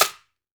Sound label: SHAK, SHAKERS; Best Service Dance Mega Drums